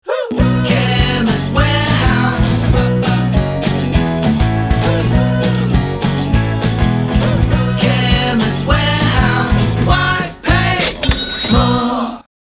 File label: Ringtone